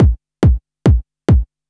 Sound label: Kik Loop, Dance, Beats